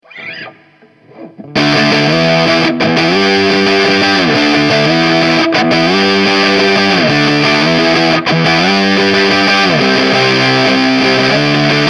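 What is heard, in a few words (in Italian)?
Kungfuzz ritmica hard, guitar effect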